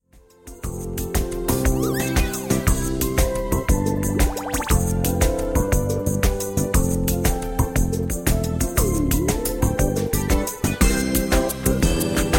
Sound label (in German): schuhsong playback